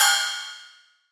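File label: ride cymbal, Individual Hits; neuro; Stanza; Drums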